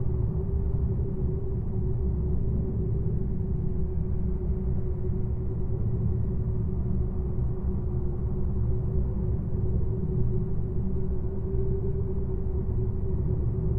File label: ambience; Chancellor in Peril; Lego Star Wars (PC)